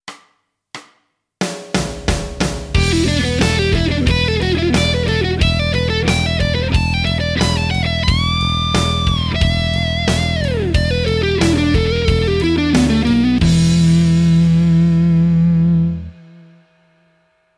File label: One Minute Lick slow, Guitar; Guitar Techniques 2019 Issue 290 January